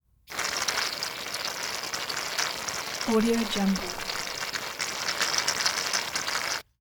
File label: shaving cream